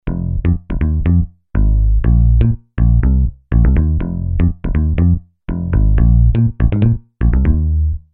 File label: bass, Bass: acoustic, Roland JV2080